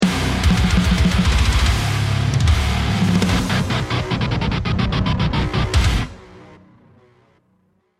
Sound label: lost round, music